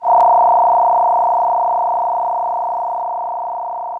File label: FX DX100 As4